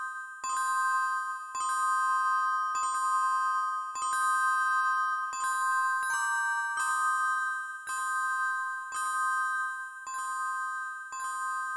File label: Frog C Proximity; Proteus